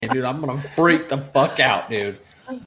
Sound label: freak out